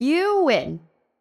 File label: game win